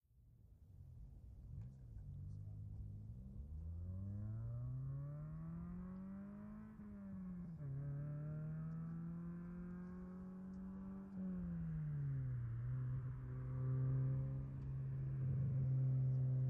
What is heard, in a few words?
Integra long approach, slow arrive, by, stop and off, Acura Integra